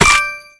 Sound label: Sidekick Remove Candy; Candy Crush Soda